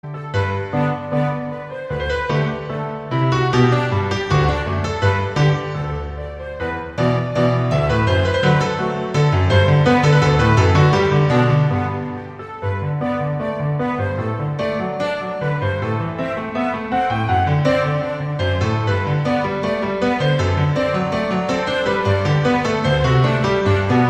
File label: Orchestral Version